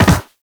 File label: Land Soft Metal, Footsteps; Characters; Operator